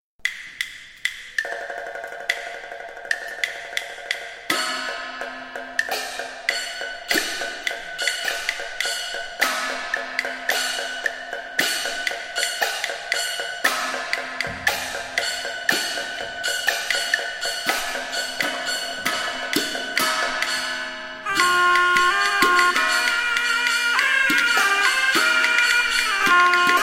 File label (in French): Sonneurs et batteurs